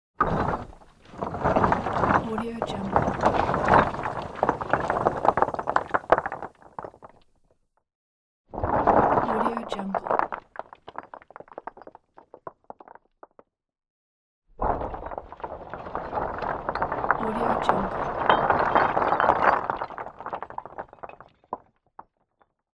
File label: noise of stones